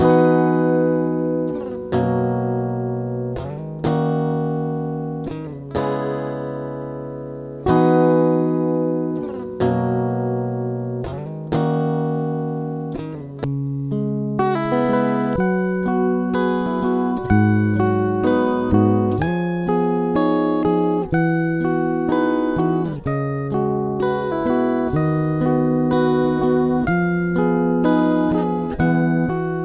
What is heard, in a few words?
guitar